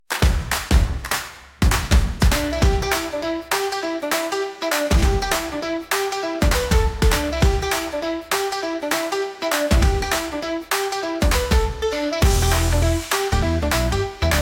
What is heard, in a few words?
Stomp